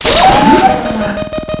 pain; quake2; tank

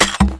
trigger; quake2; redux

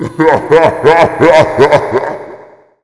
death; quake2; mnhcoop; Hueteotl

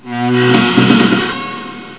creak; quake2; coop; slaughter

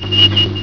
radar; quake2; tourney

